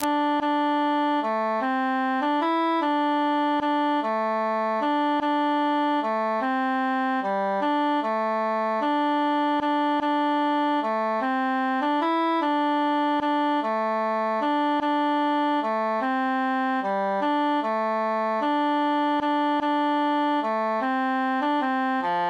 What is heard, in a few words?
three country dances transposed bassus solo